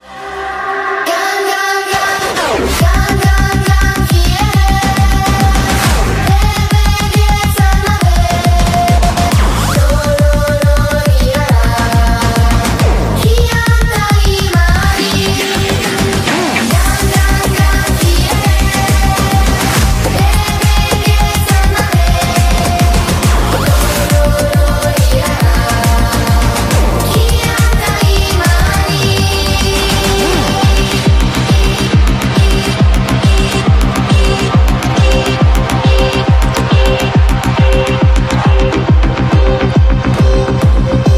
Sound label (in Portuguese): Toque de celular